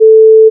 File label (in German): tontest kurz